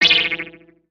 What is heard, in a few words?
Frog Hatchling Hop Jump; creatures organic